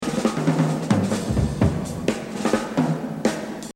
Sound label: Jazz Drumming